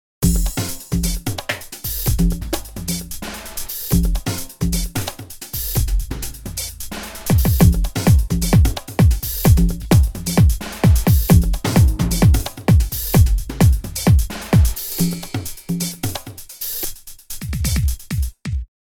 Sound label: drums